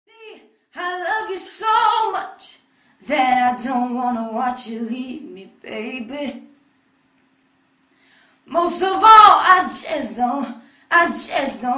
singing

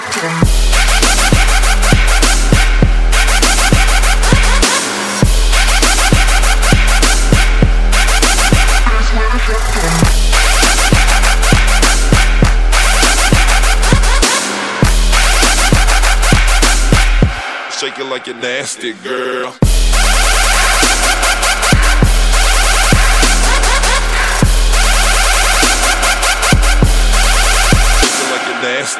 Trap

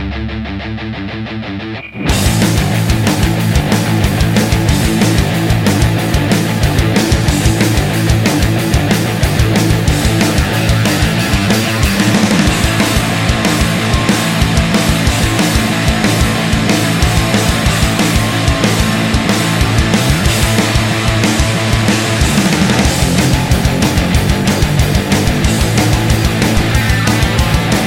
Metal

